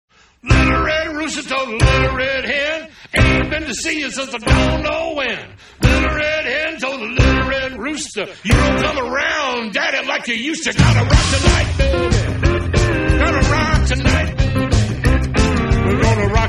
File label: jungle